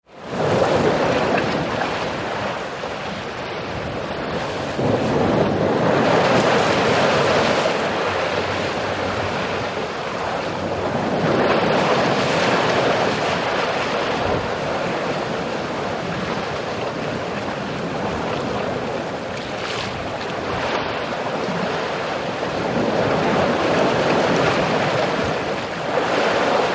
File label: Churning Surf On Rock, waves & surf